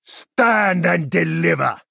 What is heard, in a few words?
brigand attack; south road